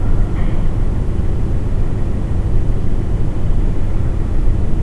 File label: tunnel